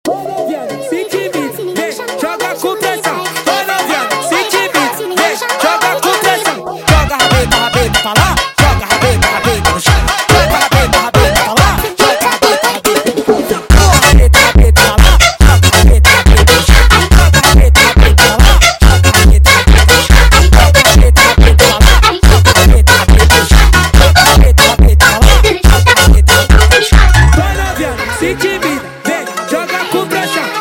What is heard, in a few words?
Funk sped Up